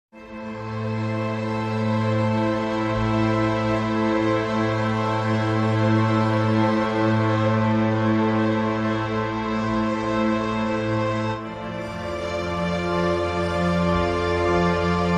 String Chords Only

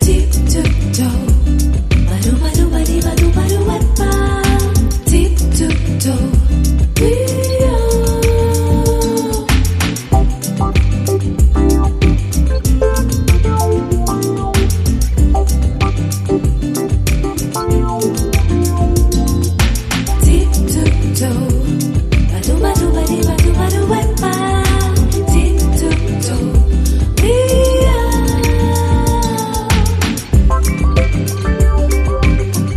Bossa Nova Beach

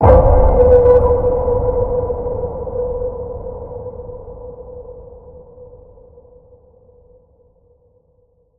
Deep Waters Ping, B

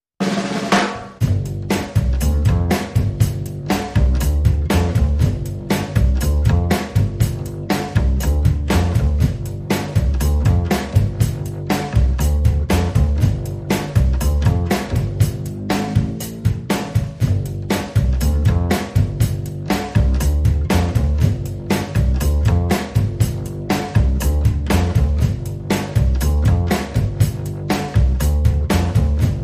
Drums and Bass Only

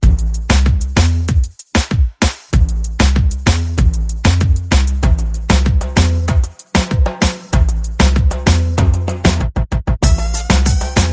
No Horns No Vocals

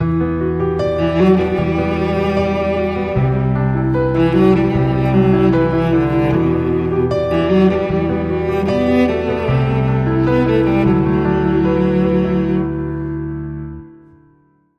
No Percussion

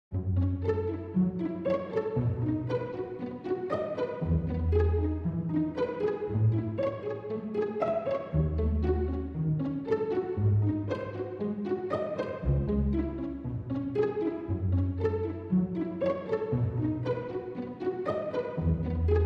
Strings Only

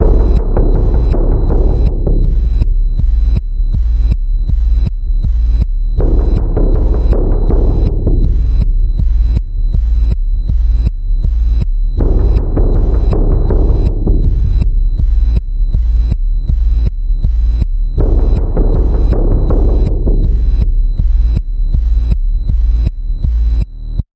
Panning Pulse A, Key of A

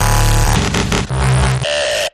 glitch

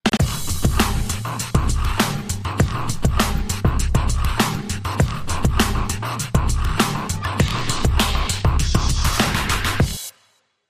Sweatbox Drums & FX

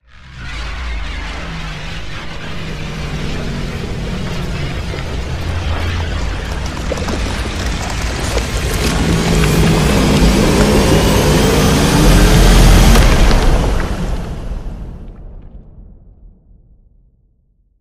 Kraken Deep Abyss Rise

